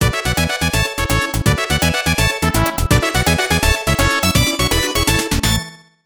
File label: Victory; Kirra Kirra Kizzu